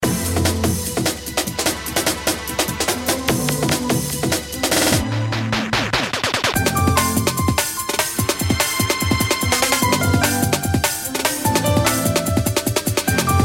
Snares

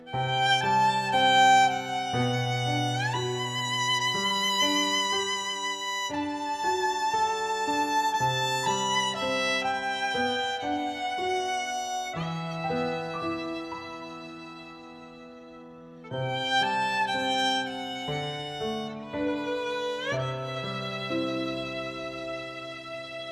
Sadness Violin

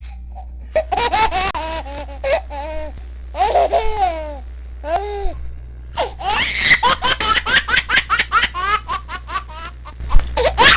Baby Laugh

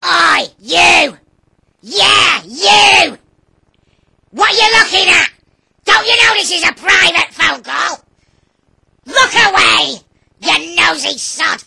Angry Kid

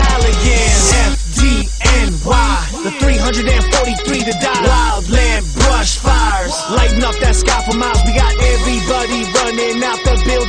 Firefighter Anthem

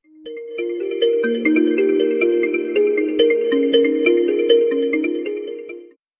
Cute bells

